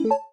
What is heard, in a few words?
pairing